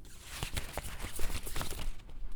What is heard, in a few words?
Smooth Texture; BB Retail Therapy Sample Pack